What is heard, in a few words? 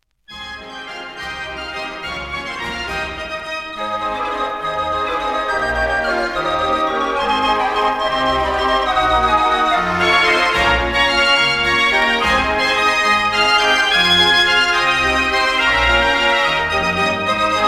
draaiorgel; de zeventiger; de duif; mohlmann